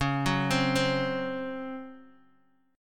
C sharp Major 7th Suspended 4th C sharp, Guitar Open D